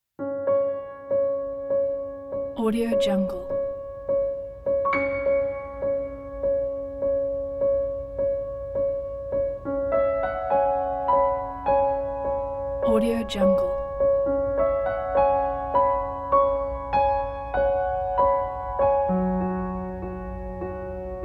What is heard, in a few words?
lonely winter piano